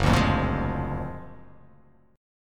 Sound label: F sharp Augmented Major 9th F sharp